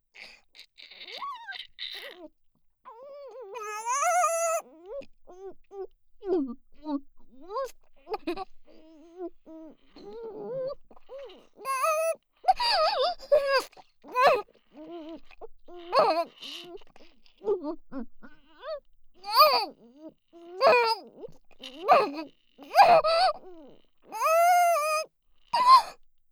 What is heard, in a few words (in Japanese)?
フェラ音(イマラチオ)